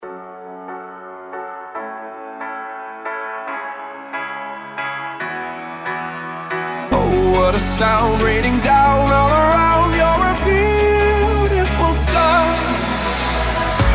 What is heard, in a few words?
disco; Duo disco adults 2015 - 2016